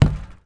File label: Street Ball Acrylic Back, Street Basketball; SFX Kit